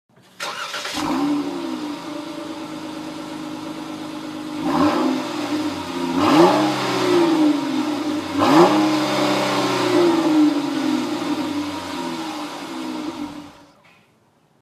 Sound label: Dodge Durango V6 Exhaust